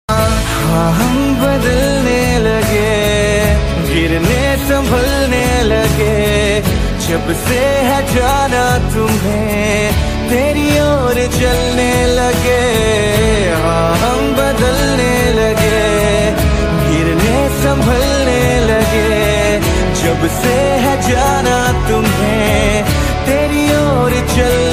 male version ringtone

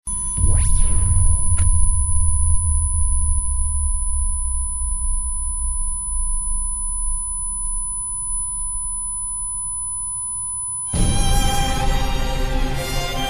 sad bgm music; raja rani

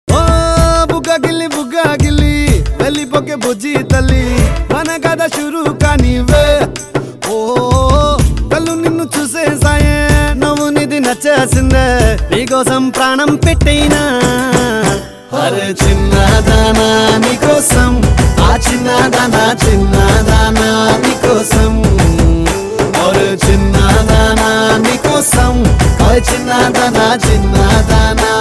love song, telugu

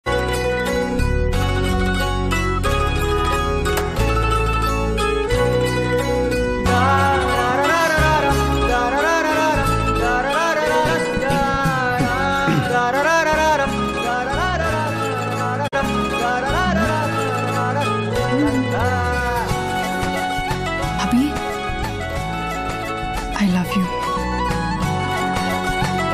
best love bgm; agnyaathavasi movie

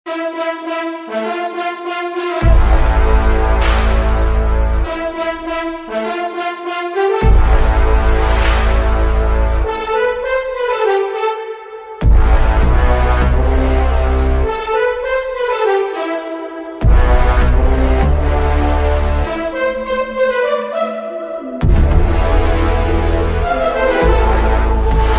tamil bgm ringtone remix ringtone; Bigil

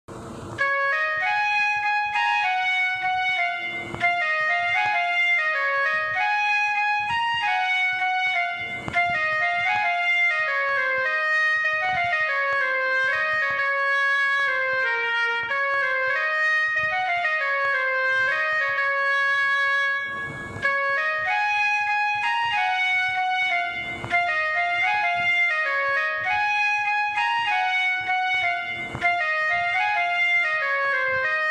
flute theme BGM; omg oh my god movie

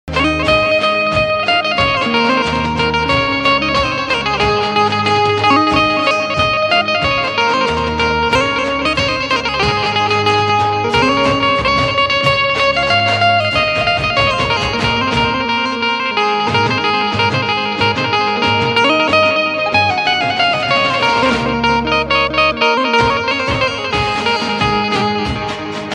benjo ringtone new gujrati ringtone instrumental

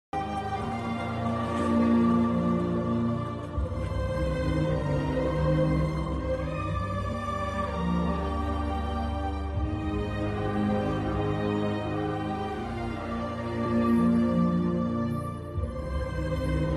movie emotional bgm ringtone; pushpa 2 movie; iconstar allu arjun; rashmika

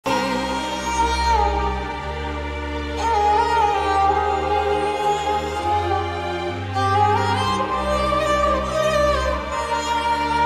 song ringtone, bgm

